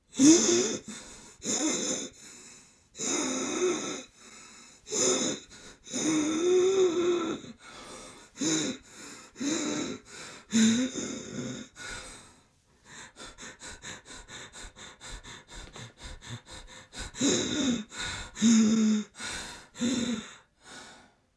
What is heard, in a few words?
Gasping For Air